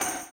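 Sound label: tambourine, 160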